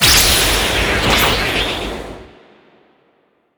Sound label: Magic